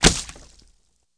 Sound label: flesh, violence; Benny Placeholders